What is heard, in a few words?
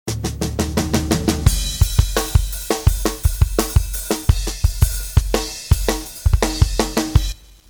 hip loop, DrumLoops; Hip-hop 1